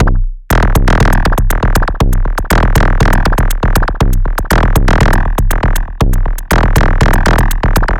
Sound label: Structured Rhythm, IDM Rhythms